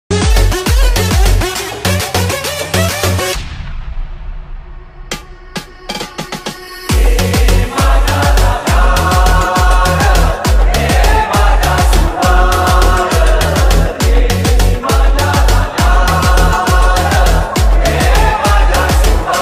marathi ringtone, bgm ringtone, instrumental ringtone; chatrapati shivaji maharaj